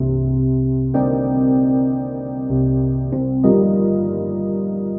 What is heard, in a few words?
piano, Loops Sad